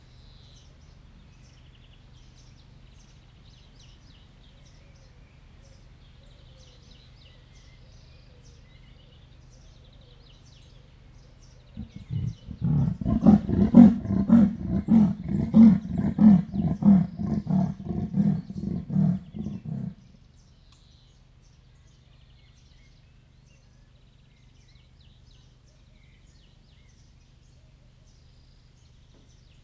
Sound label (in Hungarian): kifutóból a házába akar bemenni, leopárd; jászberényi zoo